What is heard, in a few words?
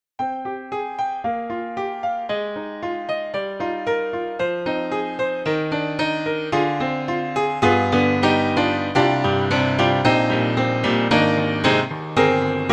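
pop acoustic, piano; IK Multimedia; SampleTank2.5XL; Lite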